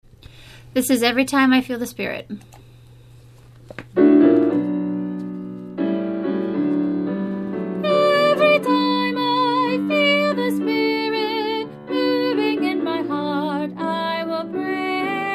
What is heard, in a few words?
solo alto practice